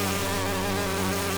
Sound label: big fly charge loop; Hollow Knight